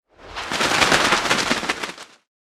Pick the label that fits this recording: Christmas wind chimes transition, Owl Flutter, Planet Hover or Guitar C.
Owl Flutter